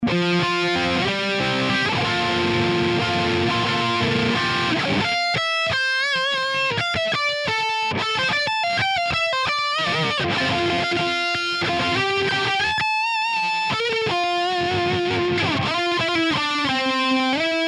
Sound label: Laney VH100R Solo Ballade